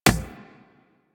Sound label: Beach Ball Floof Kick